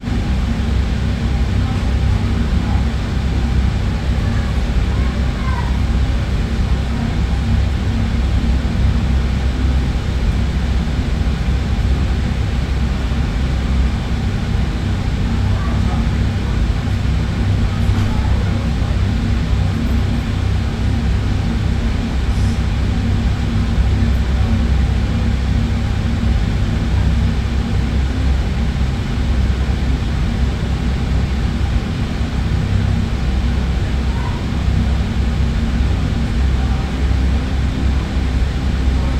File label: transport boat ship ferry onboard engine drone people in background